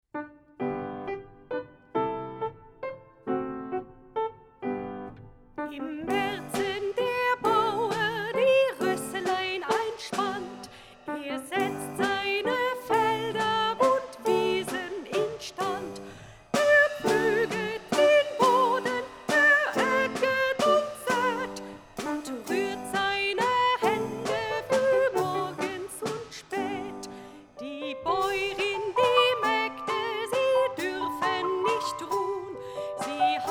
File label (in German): Im Maerzen komplett mit Gesang